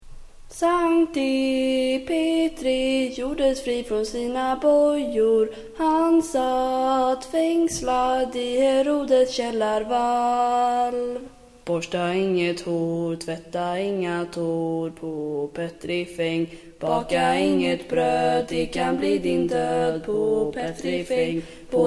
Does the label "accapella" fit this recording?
Yes